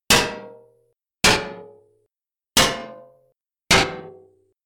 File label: Metal Hit With Dull Ringouts